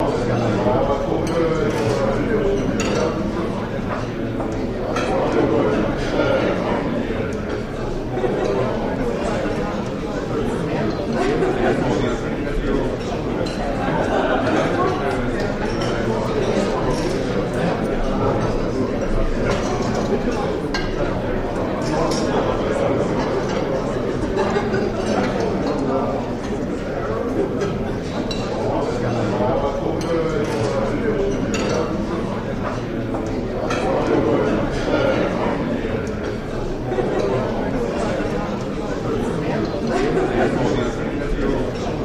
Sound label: Restaurant Walla, Light Talk and Plates; restaurants & cafes